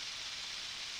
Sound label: Background Noise